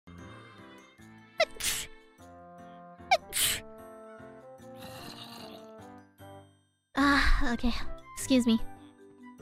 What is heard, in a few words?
Sneeze